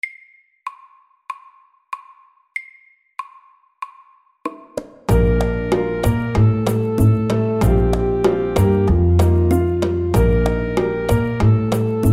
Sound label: Spicy salsa SLOW 95